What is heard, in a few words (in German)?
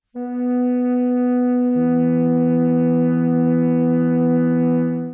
flöte h1 e1; Intervalle, die man durch Potenzen von 2 und 3 darstellen kann